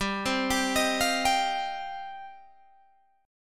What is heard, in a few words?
G 7th Suspended 4th Sharp 5th, Guitar Open G